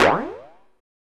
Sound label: Bounce Up; FREE SFX PACK VOL 1